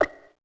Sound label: SWAT RADIO